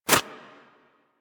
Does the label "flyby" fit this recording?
No